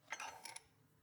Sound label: weight bolt pull out; sport freizeit